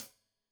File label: Hat Tight, Hi-Hat, Cymbals